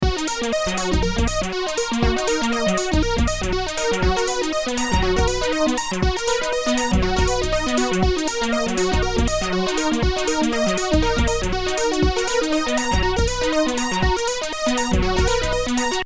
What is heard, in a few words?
swug 16th